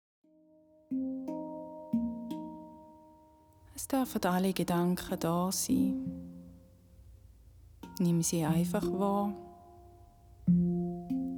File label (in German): Innerer Frieden mit Hang